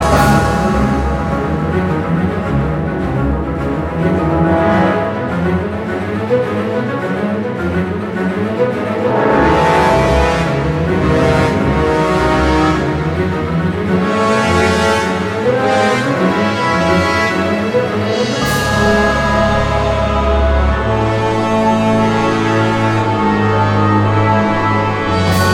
Battle C#m 105bpm; Disney Infinity 2.0 Marvel Super Heroes; Arachna

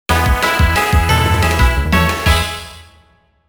Received Castle Points!; Pokemon Black and White 2 Super Music Collection

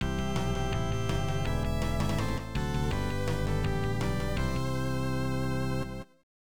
electone; Toukon Komete; Great Sluggers 94 New World Stadium; namco nb 1; arcade

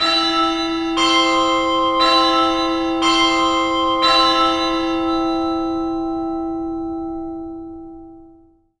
Bigbell; Kirby's Extra Epic Yarn

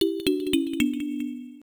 SE Confession Failure; doukyusei ibm pc

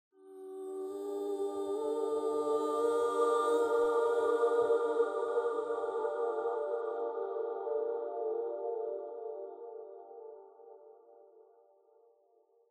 Stinger; Hel Beach

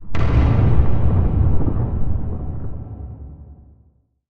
Mine Screenshake Explosion; Doodle Jump 2

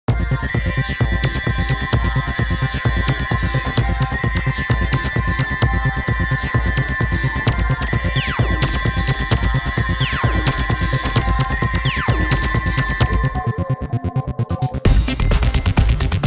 Item Synth

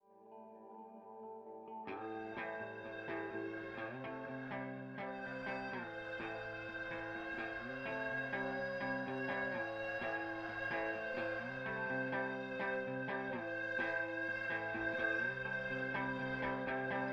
Fireworks Intro 126bpm; battlefield hardline; ps3 ps4 windows xbox 360 xbox one

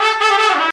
trumpet danger; Left 4 Dead 2

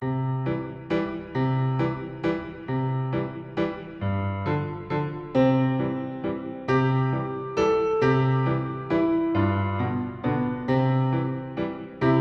christmas game ui; Identity V

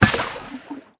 Bird Enter Water; angry birds rio

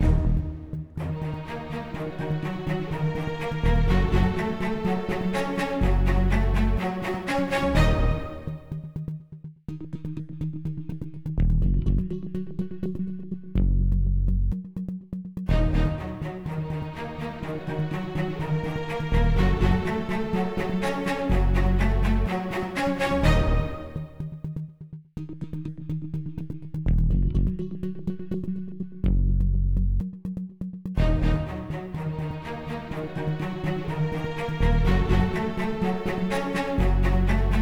Melody Loop; bakugan champions of vestroia 2020; switch gamerip

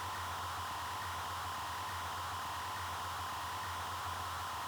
Piano Drone; The Town; Intro Sequence

